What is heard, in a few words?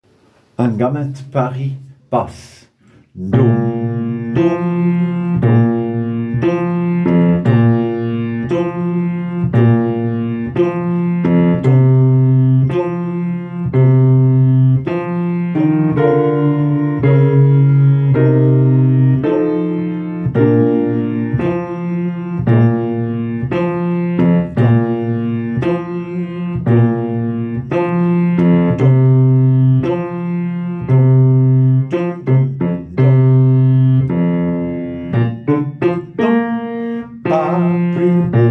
Bass